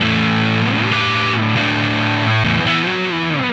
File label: Electric Guitar, Loops; Guitars